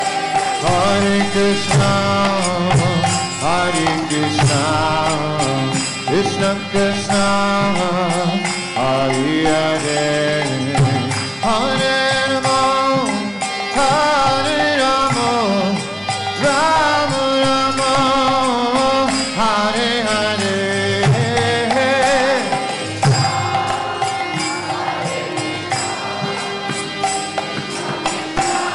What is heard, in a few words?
Hare Krishna Kirtan Live; Kirtan Mela 2013